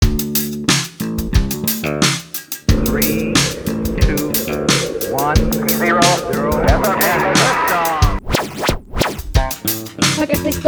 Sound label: Rap